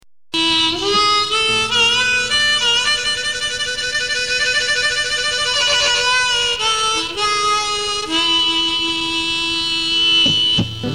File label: Blues